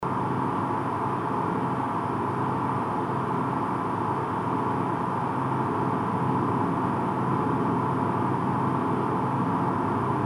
noise

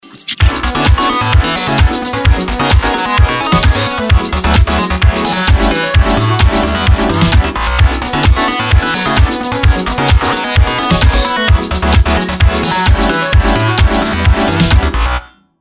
Morph

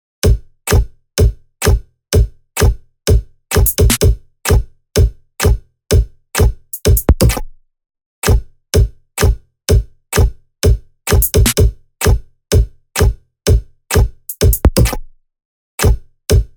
the drum

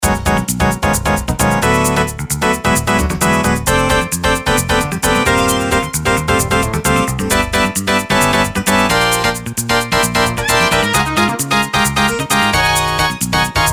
Loop

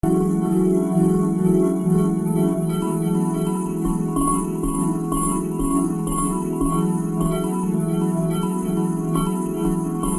dream ride

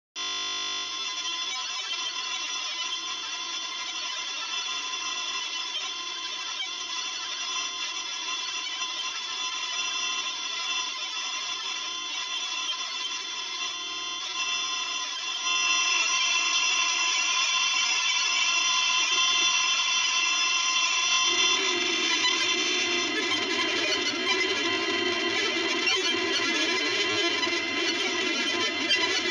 glitchs

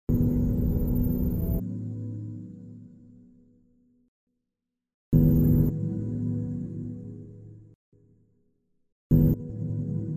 jam

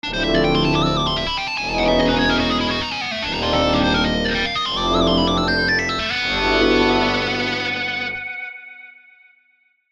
end dirty

